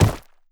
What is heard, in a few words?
rock strike; Rust